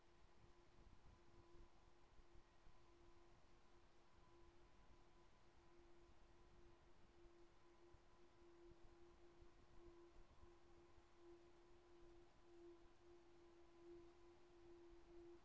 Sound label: HYDROPHONE 16000Hz; JASCO AMAR HYDROPHONE 740